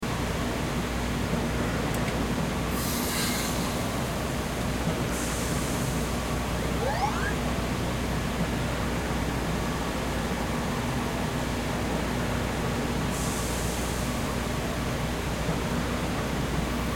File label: Ambient; Flippin Kaktus